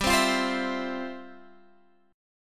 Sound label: G 13th Suspended 4th G; strummed